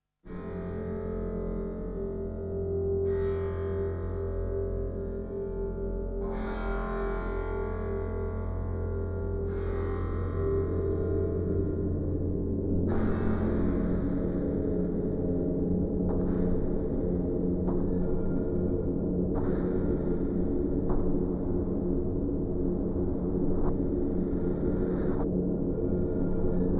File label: wide ambient tone